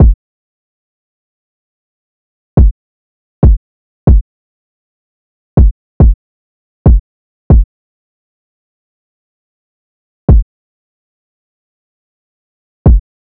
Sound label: Drum Loop 140 BPM Kick; Cymatics; Mystery Pack Vol 4; Sturdy